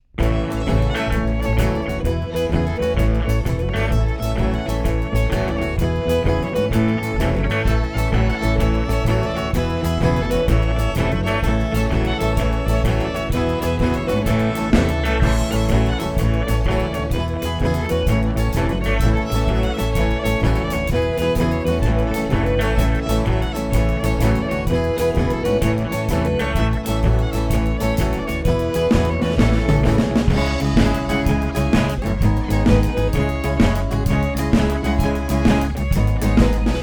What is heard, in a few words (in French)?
instrumentale